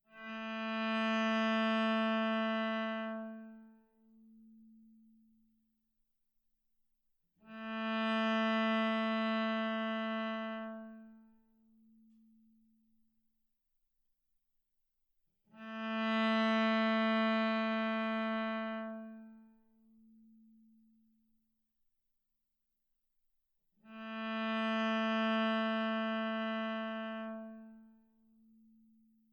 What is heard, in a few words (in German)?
Stimmton A 442; Uglaševanje A